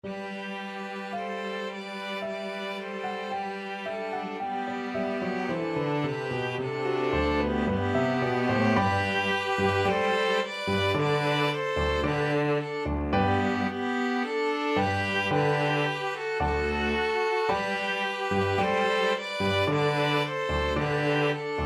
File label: ode to joy piano quartet